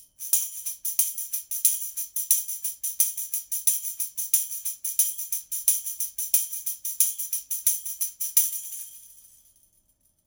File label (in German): Schellenkranz